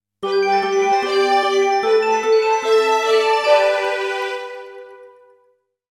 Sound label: All eggs collected; easter bunnys big day ps1 gamerip 2003